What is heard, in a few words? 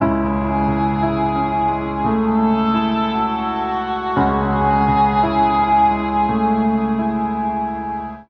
piano, Loops, Sad